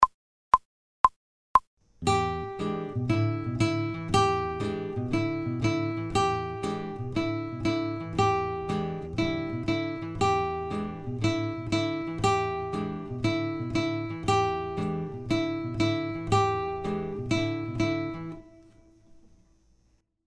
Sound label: Blues fast; Yup More Blues